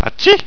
niesen; winopoly

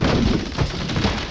rollo

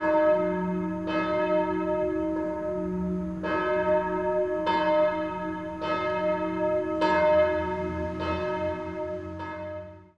Kirche; dach

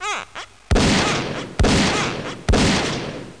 GEWEHR

